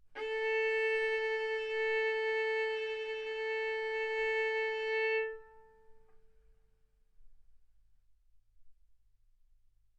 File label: Violin arco mf sul A A4 B4 stereo